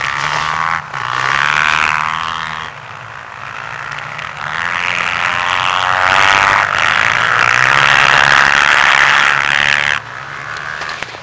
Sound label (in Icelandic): hvaleyrarvatn motorhjol, mótorhjól við Hvaleyrarvatn